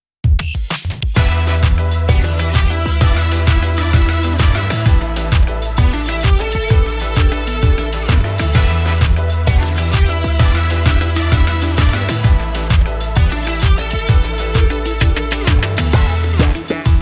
Menu; Capcom Fighting Collection 2; Overdrive Blaze

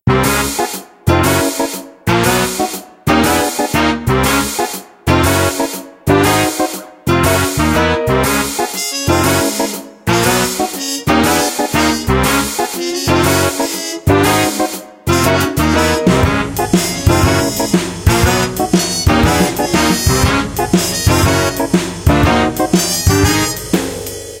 Loop

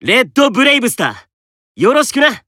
Emo Warcry

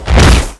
Enemy Temple Active Shot; gamerip

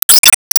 Ball Warp Out; gamerip

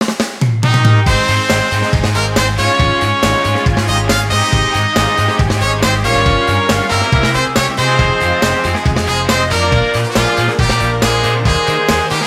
Music event; Power Pro Kun Pocket R

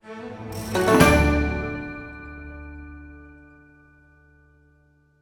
stinger Chest Opened

